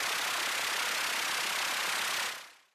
spin

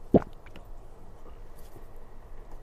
gulp; animal

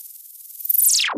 wipe

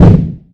kick

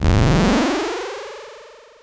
power up

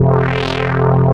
blink

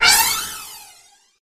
motion; animal

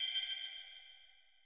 recovery

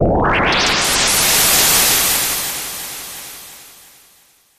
warp

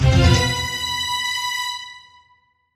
anxiety, jingle

